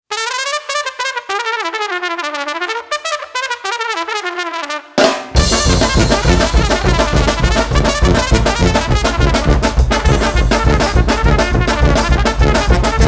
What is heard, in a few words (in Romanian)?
Fanfare Batuta La Trompeta